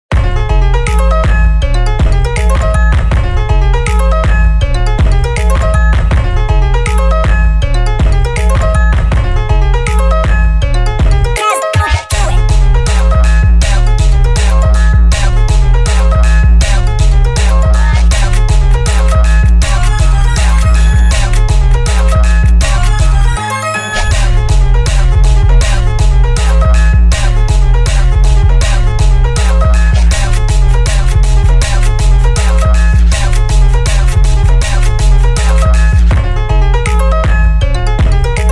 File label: beat high pitch; gumdrop ahh